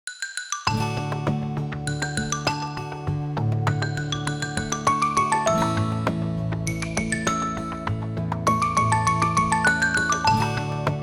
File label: cyclotron, ringtones; OnePlus 6 Ringtones